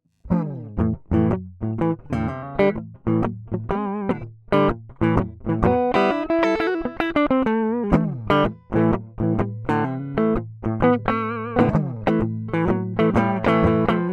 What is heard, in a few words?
lp blues